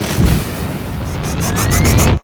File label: fire vent charge up; Warframe; Corpus Gas City; Gameplay Remaster; Ambience